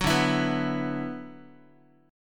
D 6th Add 9th D, Guitar; D6add9 strummed; JGuitar

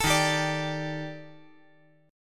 D sharp Major Sharp 11th D sharp, chord; D#M#11 strummed; JGuitar

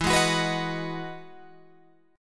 E Major 9th E down Guitar Open G

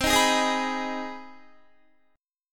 C Minor Major 7th Sharp 5th, Guitar; CmM7#5; strummed

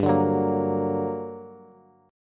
G Minor Major 7th, down, Bass; GmM7 strummed; JGuitar

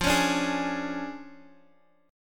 D Minor Major 7th Flat 5th F, guitar

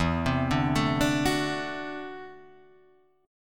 D 9th E, guitar; arpeggiated; JGuitar

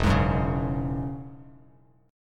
E 6th Add 9th E down Bass Standard; E6add9 strummed; JGuitar

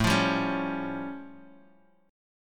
A Augmented 7th A Guitar DADGAD, chord

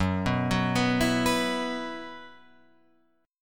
Gb 7th Suspended 4th, Guitar Open F; Gb7sus4; arpeggiated; JGuitar

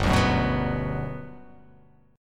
G Major 7th, G, down

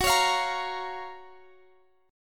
F sharp Major 7th; F#M7 Chord; strummed; x,x,16,18,18,18

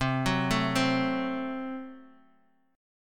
A-Minor 6th C, chord

